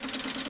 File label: lift; blix